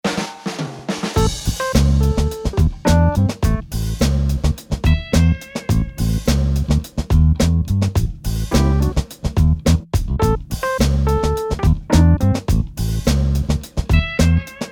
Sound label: Funk